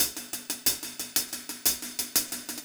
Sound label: Cymbol Particle; Particles